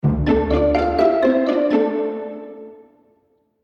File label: jingle